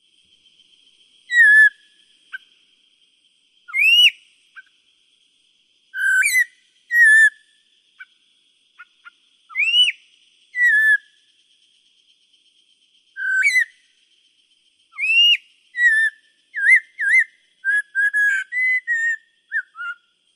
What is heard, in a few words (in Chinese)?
棕噪眉; 竹鳥; 噪眉科/留鳥